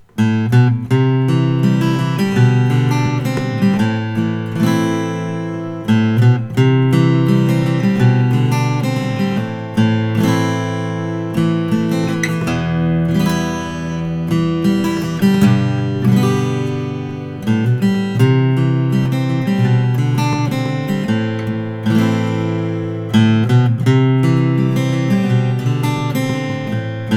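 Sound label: martin custom 00 42 engelmann madagascar natural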